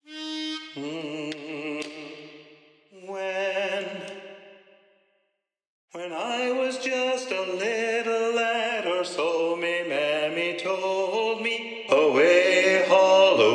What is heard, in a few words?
Bass Predominant, sea shanties